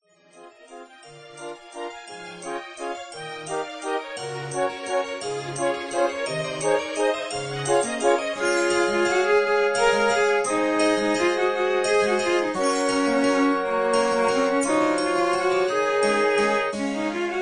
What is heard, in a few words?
Cello